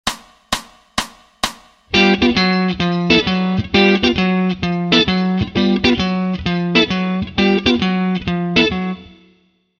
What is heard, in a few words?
Walk Bass Lines